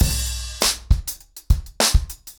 Drumkit 100BPM, ACOUSTIC DRUMS; Sonokinetic - The Roots Drumloops

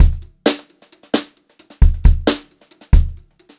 Brushed Drum Loops

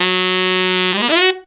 TEN F# LR, Phantom Horns TENOR FX; Zero-G

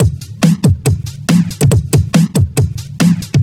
Break Beats, 140 BPM

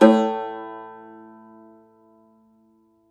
Cumbus SAZ A, Plucked Strings; E-MU Producer Series Vol. 7 – Old World Instruments

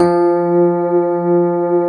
GRAND PF F 2, AKAI S-Series; AKAI S-Series CD-ROM Sound Library VOL-7; D.GRAND PF

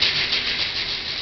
shaker, Amiga Soundtracker Sample Packs; ST-XX Amiga Soundtracker Sample Packs

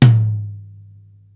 tom dry hi, Yamaha Motif ES-Series; 300 Drum Machines

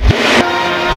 GRUNGE; AMG - Vince Clarke Lucky Bastard

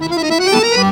ACC TRILLER; Best Service; World Colours

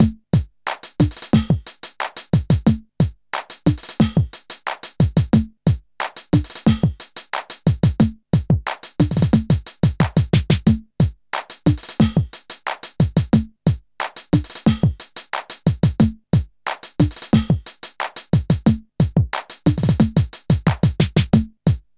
TECHNO; INIS; Funky Xtreams

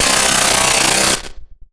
Casio, Glitched, malfunctioning synth

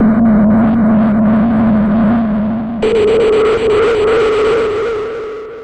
pool, Drum N Bass; Sonic Foundry (Sony Creative Software); Knutrix Drum & Bass

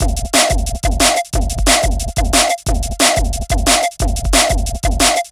Future Beat, Drum & Bass; Fluid Dynamics

